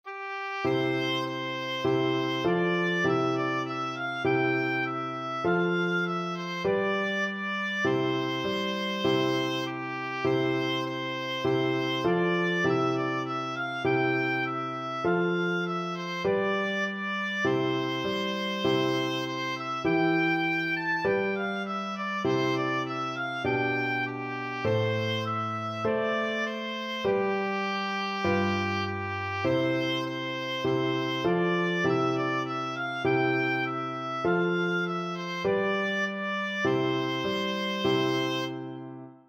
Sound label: o little town, oboe